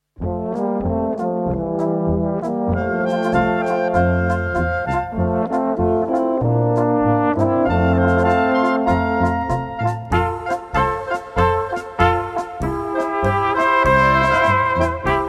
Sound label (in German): SÜDBÖHMISCHE POLKA